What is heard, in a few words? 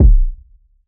Kick Processed